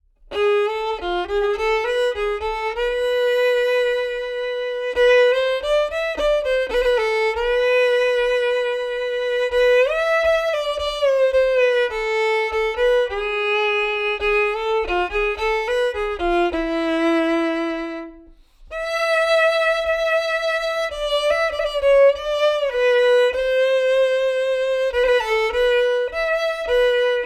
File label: zemer atik melody, fiddle